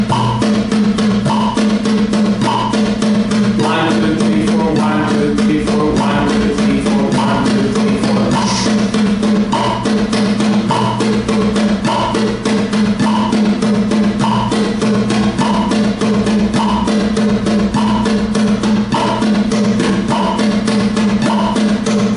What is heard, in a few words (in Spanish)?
Rasgueo, guitar